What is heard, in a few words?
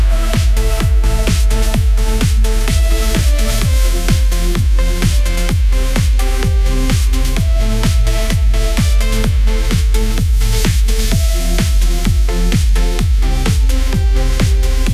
midnight neon groove, ringtones